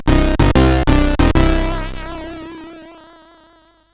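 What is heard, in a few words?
Lights On, MOS CSG8580R5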